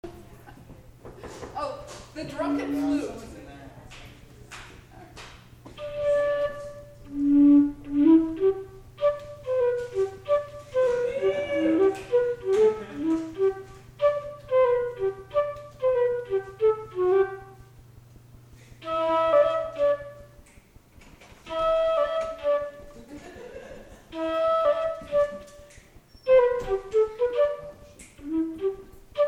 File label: Flute; open mic/variety show